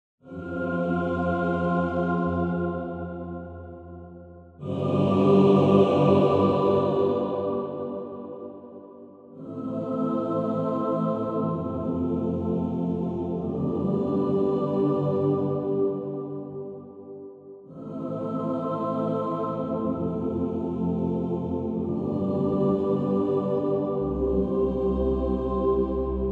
Choir Micro

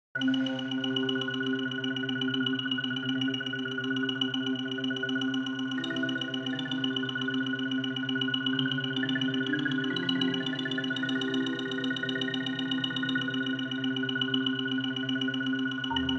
World Instruments

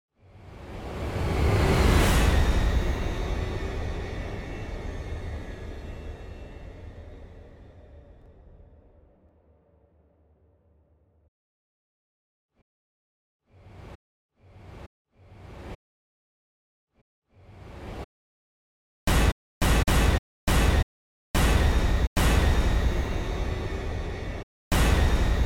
rise hit

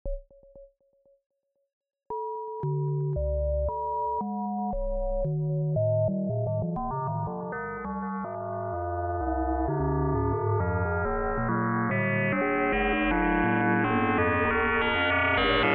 Bazille

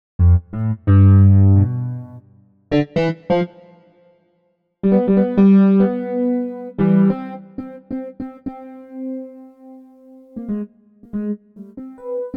DX Keys

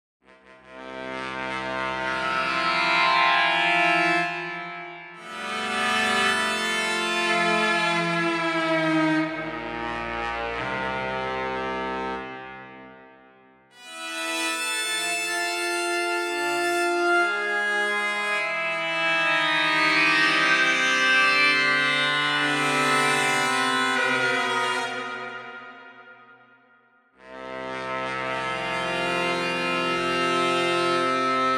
Hot Brass

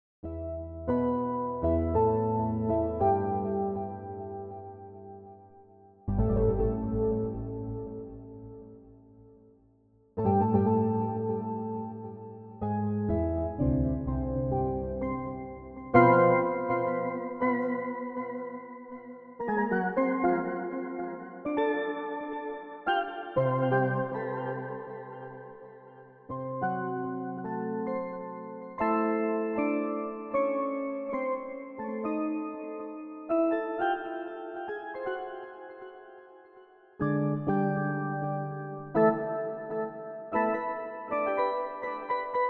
String Studio VS3

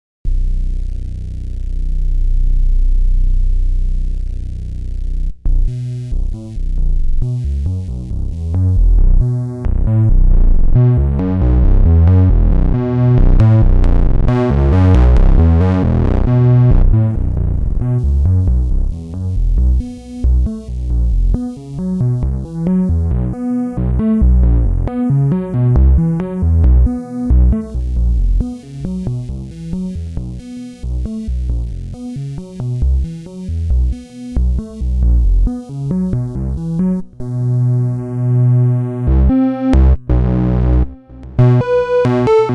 ARP 2600 V

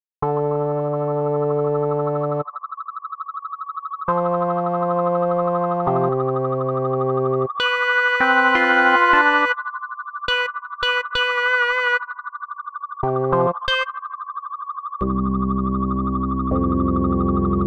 Tempo Delay

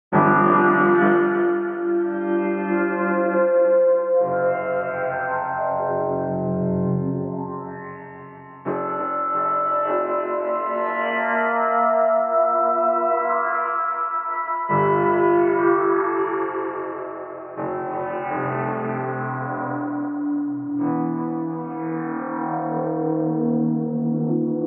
Objeq Delay

